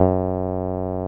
SIEL, String Machines

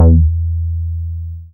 BAS Mini, BAS1SYN; Best Service Gigapack 2

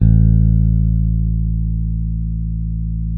bass, sustain; Sampleheads; Will Lee Bass Library

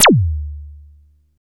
FX, attacks

